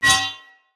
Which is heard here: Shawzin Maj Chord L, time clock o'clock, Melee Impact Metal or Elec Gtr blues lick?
Melee Impact Metal